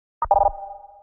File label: chime